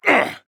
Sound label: Grunt Attack; Drogoz